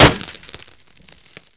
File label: metal barrel sand impact bullet; physics